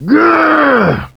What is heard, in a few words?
battle cry